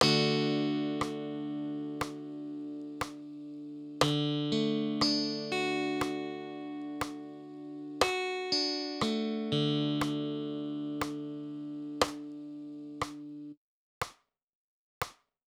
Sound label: D rhythm